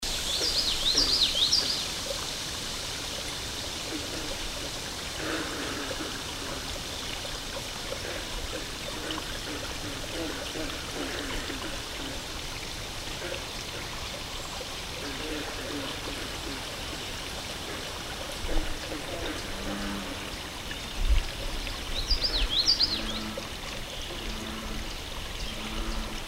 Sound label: Frogs and Water, Sounds of Nature